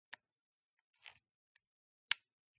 Camera Film 35mm SLR Exposure Compensation Dial Rotary; CANON A1; MKH8040